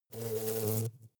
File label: hornet hum short